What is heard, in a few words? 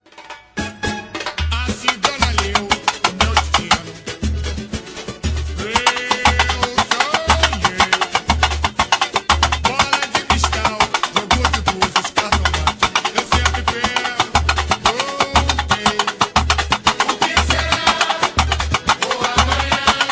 amanha tamborim